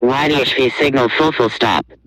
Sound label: wi-fi signal full